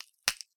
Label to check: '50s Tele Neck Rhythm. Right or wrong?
Wrong